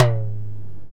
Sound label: Dumbek